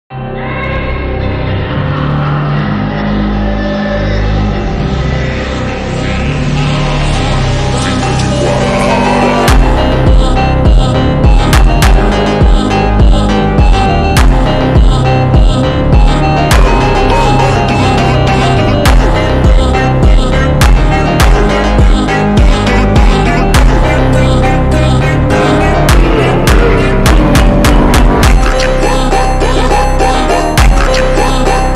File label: slowed reverb